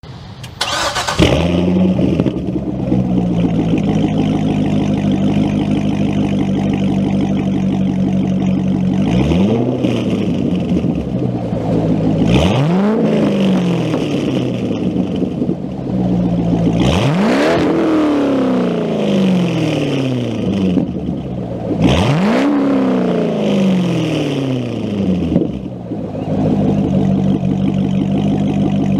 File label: Straight Pipe; Vetty